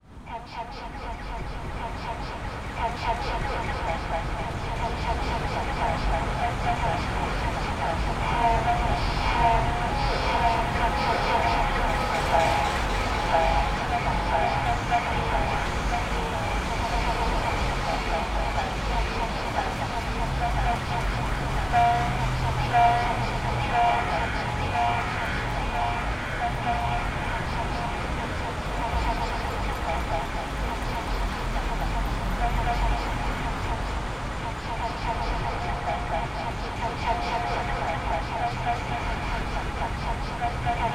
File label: delay echo